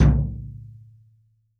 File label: BD, REAL DRUMS, JUNGLE KIT